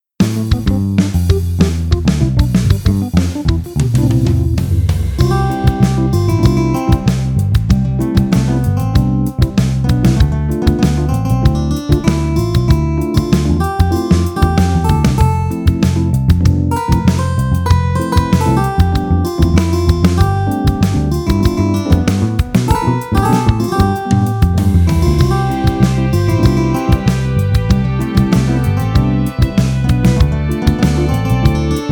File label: happy bounding